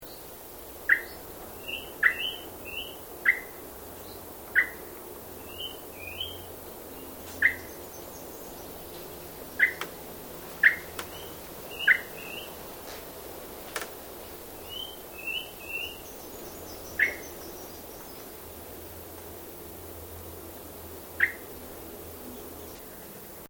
Bird Swamp Chirp, Australian swamp birds; Animals

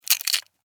Insect, Claw, Grab, Snap, Movement, Bug; Animals:Insects; Insect Claws; Blastwave

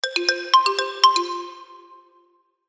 Happy Motif App Music; Musical

